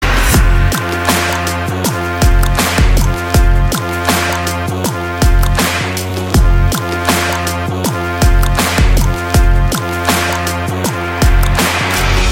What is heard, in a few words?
Music BGM; RX 100; Telugu